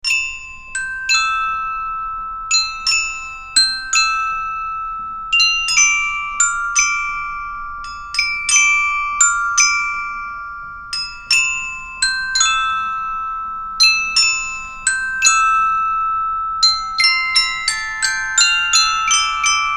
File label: glockenspiel etude